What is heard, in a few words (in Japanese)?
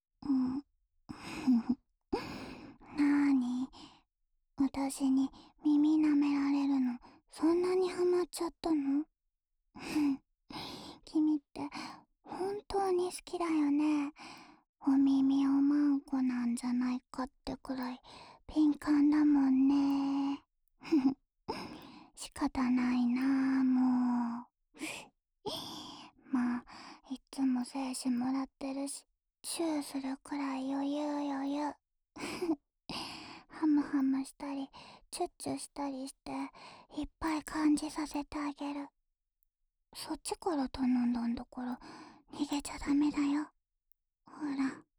リラックス用浅い耳舐めループ（左耳）, ASMR